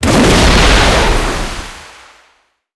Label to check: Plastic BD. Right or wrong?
Wrong